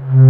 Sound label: RAD HOSE C3